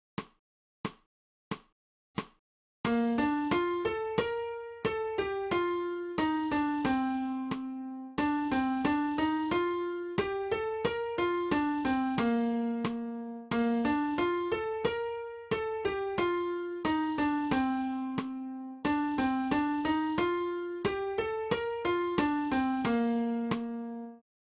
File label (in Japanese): 新フレットレス